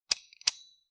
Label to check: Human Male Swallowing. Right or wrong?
Wrong